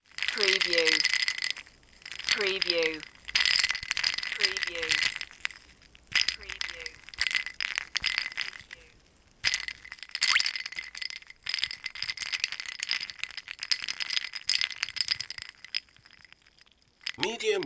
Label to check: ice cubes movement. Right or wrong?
Right